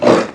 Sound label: grey wolf damage